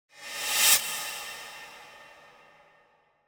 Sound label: Whoosh Metallic Thin Attention Grabber; Hollywood Edge; sound designer's toolkit v2